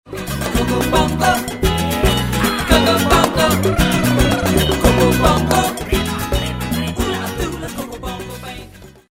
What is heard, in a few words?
jingle